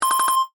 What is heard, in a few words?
Communication Ping, Scifi, Communication, Ping, Science, Fiction